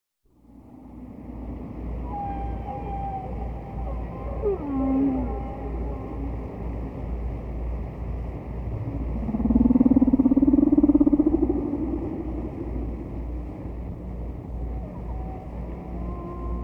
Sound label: Distant Whale